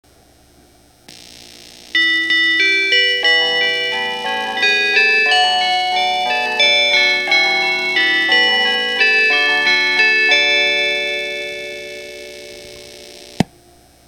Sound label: kiha40 chime; higashinihon